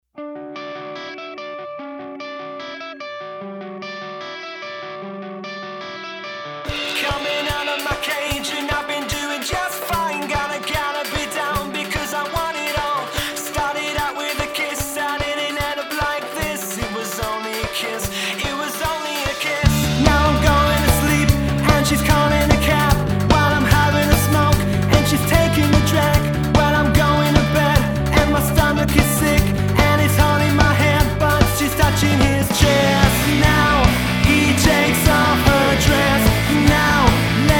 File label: Rock Pop Band; West Midlands